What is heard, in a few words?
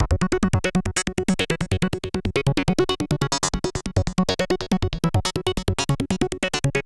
Root F# 140 BPM; Vengeance Samples; Special Sounds